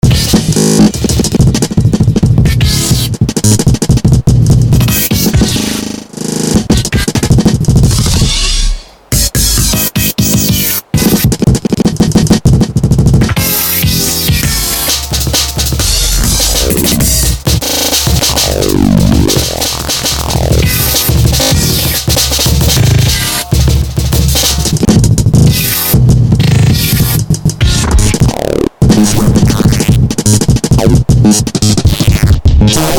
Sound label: drumming session